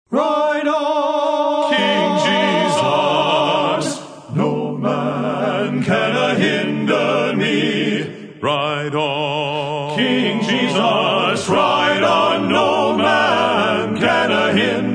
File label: Men's Quartet